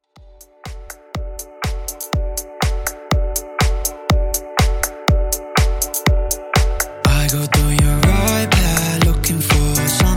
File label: Dance Club, 122Bpm